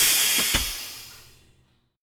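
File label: Aircrafts MiG-29 Canopy Close End Cockpit; DCS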